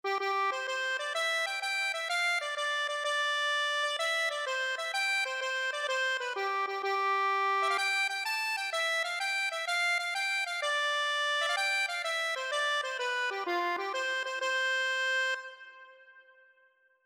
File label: new year, accordion